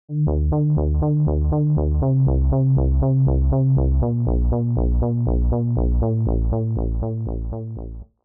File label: late 90tys seq